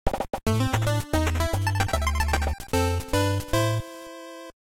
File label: relic get jingle